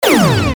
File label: Futuristic Gun Sound; FXHome; Bullet and Gun